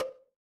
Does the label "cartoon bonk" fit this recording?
Yes